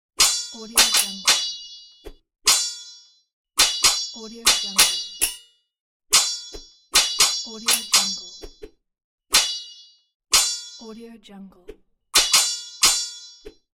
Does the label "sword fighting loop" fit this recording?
Yes